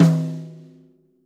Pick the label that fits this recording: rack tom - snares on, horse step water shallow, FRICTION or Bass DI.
rack tom - snares on